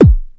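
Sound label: bass drum zap mid; 300 Drum Machines; Yamaha Motif ES-Series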